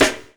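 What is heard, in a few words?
snare drum funk; E-Lab; X Static Goldmine 2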